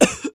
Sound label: cough, creature